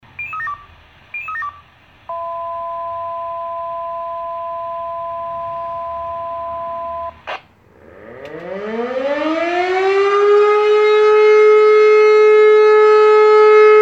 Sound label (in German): sirenenentwarnung